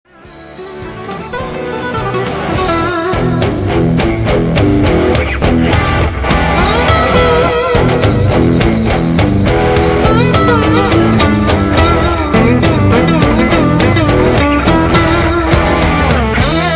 HEAVY BOTTOM

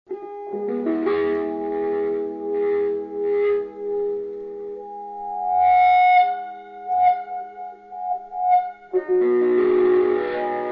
chord

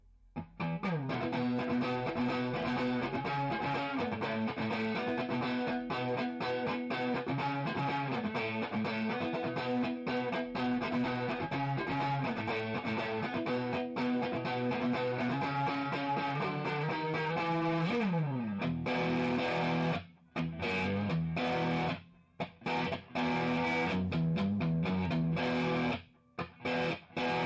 Front Distortion

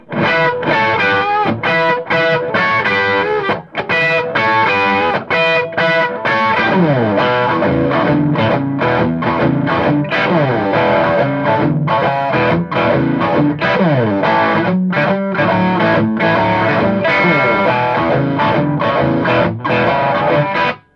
Drive

